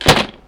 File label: Dropping A Gun